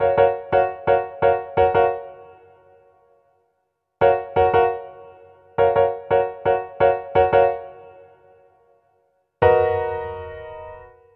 Piano, cinematic funk

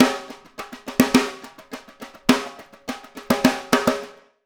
snare pattern; Drums; Snare Patterns; Essential Percussion

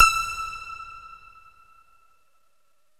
LINEAR SYNTH

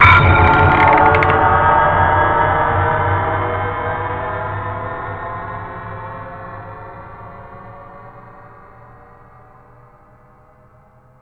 FX Sounds, Drum Machines

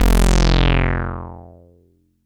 Bass, 2600 F1, Synths, ARP 2600

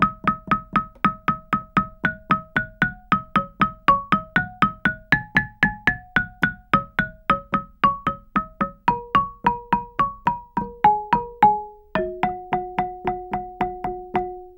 GAMBANG PH; Spectrasonics; Heart of Asia

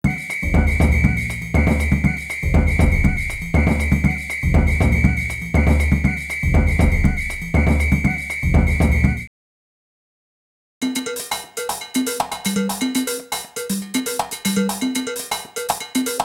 Salsa Picante; Masterbits; World Zone VOL-3

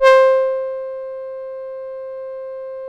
700 TROM C 4, Korg; Back In Time Records; Korg Universe VOL-1

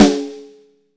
METAL, SNARE

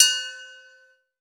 Kane Side; Cymbals

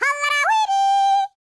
YODEL, World Voices

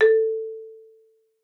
A4, marimba; Xsample VOL-4

